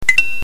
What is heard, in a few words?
coin